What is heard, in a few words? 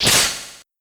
sheathe; jubei